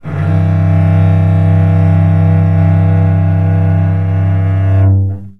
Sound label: sixth chord, cello